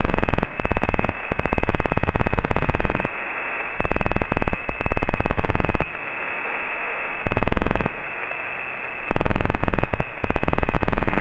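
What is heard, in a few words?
glitchy antenna realignment, spacecraft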